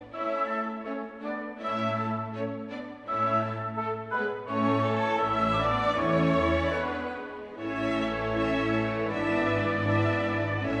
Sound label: Larghetto (piano); Mozart